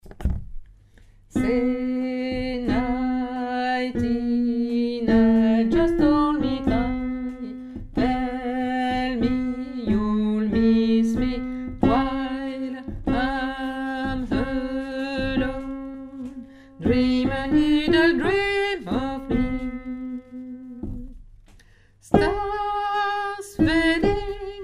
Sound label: alto